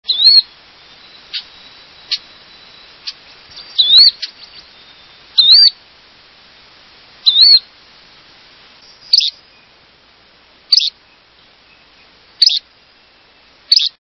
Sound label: Yellow bellied Flycatcher